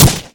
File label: sniper shoot; lucy